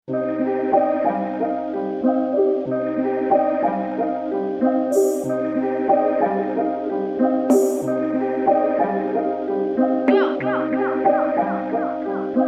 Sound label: Instrumental